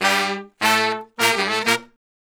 Horn Section

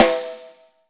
SN, LUDWIG SNARE

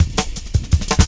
Drum Loops, Jungle

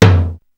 DANCE SET, DRUMS

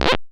303 GLIDE; Synth

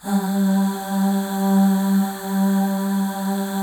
Voices, FEM AHS; Spectrasonics; FEM.2 AHS

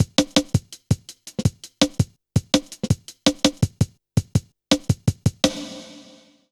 Skippy, Drum Loops, Jungle

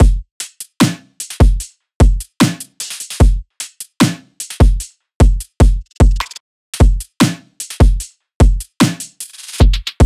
loop full 150; Seismic Loops

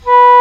Eng Horn mf B3; Digital Sound Factory Virtuoso

